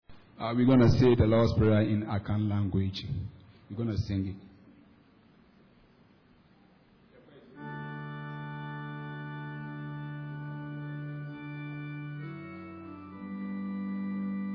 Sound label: Lords Prayer in Song; Palm Sunday